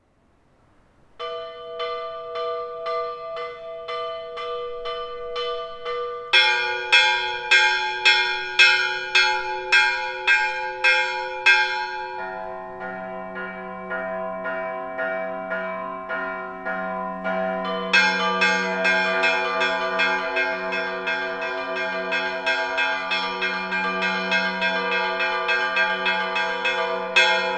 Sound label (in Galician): Repique